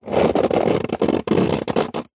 impacts metal small tin like hollow bend pressure creak